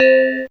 Nipple C5, Fairlight CMI Mallets

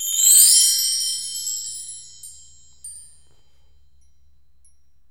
bell tree; AKAI S6000 CD-ROM - Ultimate

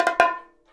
BONG, BONGO 100, Modern Percussion Loops; Soundscan vol.56; AKAI

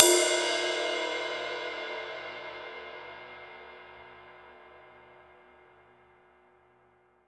RIDE, Drum Dry; East Collexion; Drum 1 Dry

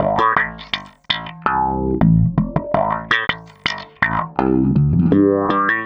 Processed Slap Bass, Electric Bass; Sonic Foundry (Sony Creative Software); Leo Cavallo Bass Taster

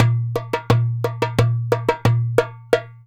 180, Mediteranean Traditions; Soundscan vol.53; AKAI

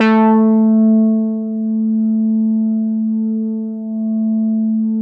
MEMORYMOOG

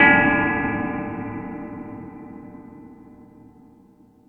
Jungel Percussion, E-MU Pro-Cussion; drum machine

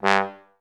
G1, STAC; Best Service; Peter Siedlaczek's Advanced Orchestra

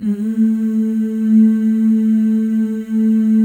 FEM, Symphony of Voices; Spectrasonics

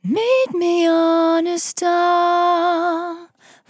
On A Star 65bpm F, Vocal; Zero-G

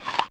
Apple, Yamaha DD-35

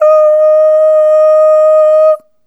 Ooh Short D#4 Dry; Harmonic Building Blocks

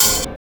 snare+hat; DRUM LIBRARY; DRUMS

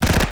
machine gun; Total Control - Action Cutz

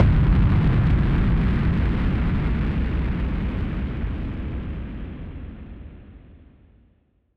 Noise Bomb; musicradar; bomb factory

